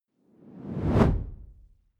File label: whoosh simple hard incoming